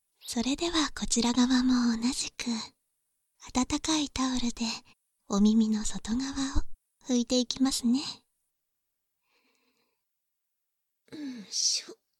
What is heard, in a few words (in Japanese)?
続 耳かき, バイノーラルマイク収録 囁き重視編集 効果音あり; 耳かき効果音差分